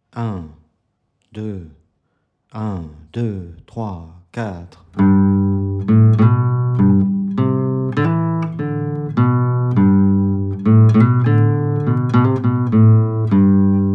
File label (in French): exercice 100bpm exercice b